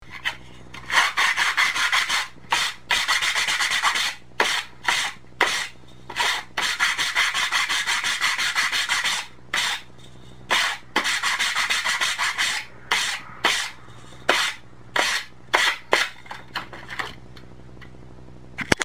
Holz schleifen

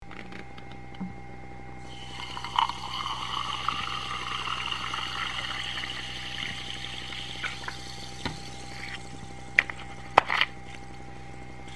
glas befuellen

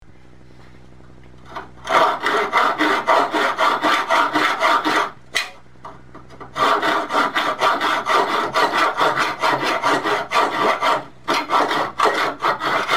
von Hand saegen

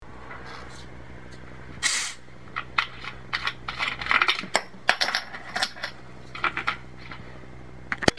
Sprudelflasche oeffnen

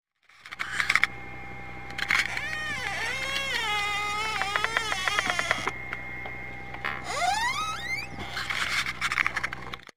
Stuhl knarren

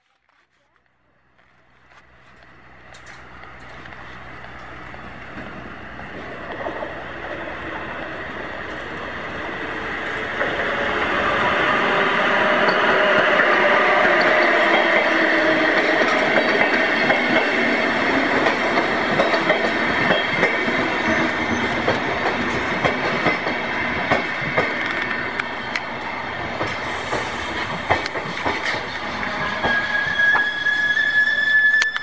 Zug kommt haelt

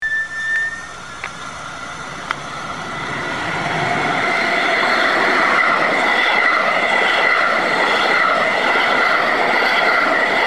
Zug faehrt vorbei

